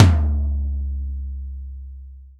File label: tom, Real Drum Kit, funk kit